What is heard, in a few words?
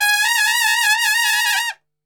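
G# trumpet shake; Best Service; Brass Super Section; TRP SHAKE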